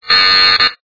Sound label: fail